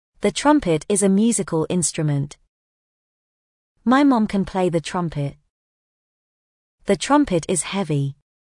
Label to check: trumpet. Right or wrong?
Right